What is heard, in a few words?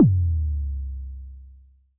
K High sub, Vermona Mono15 Drums Kicks